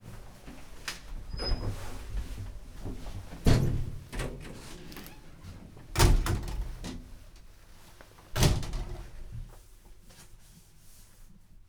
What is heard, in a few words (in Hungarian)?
utazas a foldszintrol, lift; angyalfold